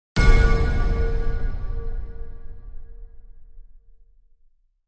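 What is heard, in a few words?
Impact Metallic Clang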